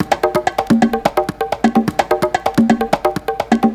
Latin House Loop 128 BPM; Vengeance Samples

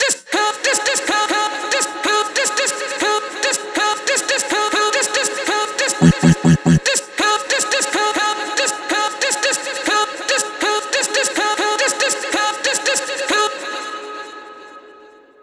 FX Vocal Guy Vocal Vers1 WET, 140 BPM; Vengeance Samples; VTDS2 Song Kits; FX Vocal Candy Guy

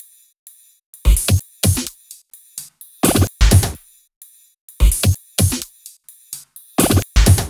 128BPM Heavy Duty Kit

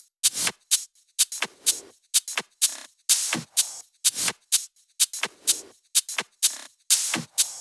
Thai Kit 6, 126BPM; Vengeance Samples

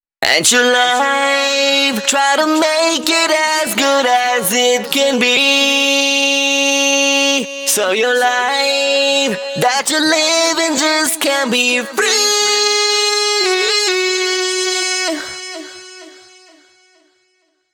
Pitched Vocals Chorus WET, 128 BPM; Vengeance Samples; VTDS2 Song Kits

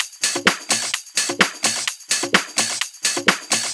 House Loop, 128 BPM; Vengeance Samples

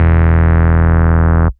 Root E, Bass

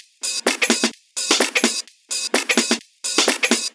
Minimal Loops

